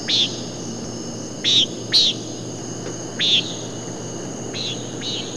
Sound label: Nighthawk